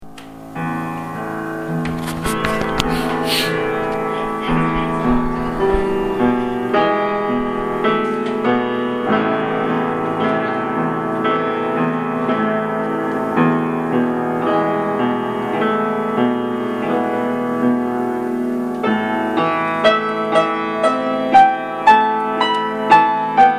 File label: Piano; Guru Heart Mantra